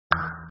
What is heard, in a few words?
click ringtone